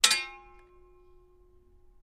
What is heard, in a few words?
Sword Clangs Sword, swords